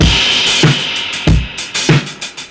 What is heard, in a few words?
Kit Break 95BPM